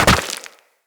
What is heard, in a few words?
Land Soft Rock, Footsteps; Characters; Operator